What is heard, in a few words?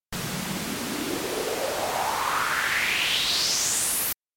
Eq Frequency